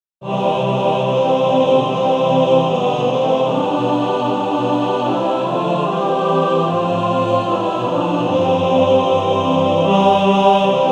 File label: Male Choir TTBB; Infant Holy